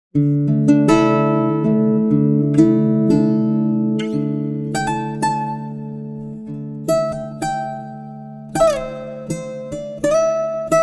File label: pitchshift guitar, Digidesign Pro Tools xform 5